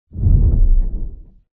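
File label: Giant Pillar Close Stop low frequency effects; Titanfall 2; Boomtown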